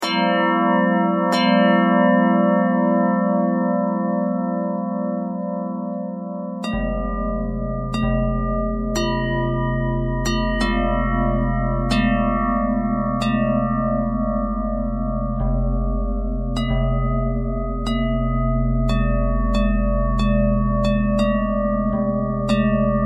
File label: bell harmonic twist